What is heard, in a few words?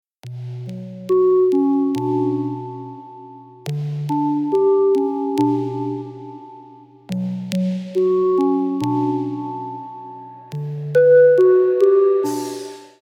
type beat